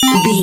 retro collect pickup item

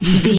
voice male breath struggle

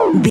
retro impact hit

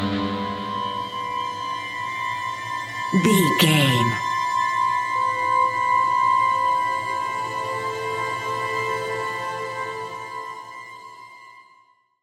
modern horror stinger

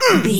voice male grunt pain

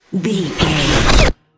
magic conjure charge

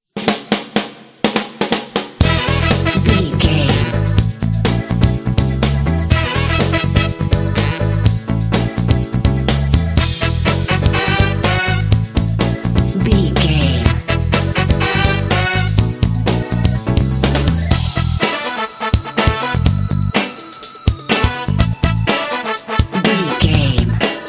its time get funky

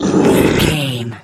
troll monster effort tired

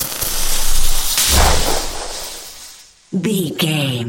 elec lightning magic spell

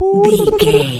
voice fun character flutter man

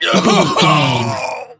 voice male death

